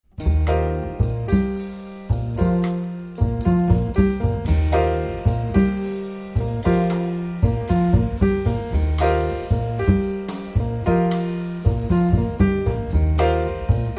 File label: samba jazz trio